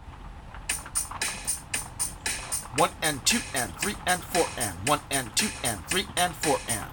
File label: eighth, drill rhythmic notation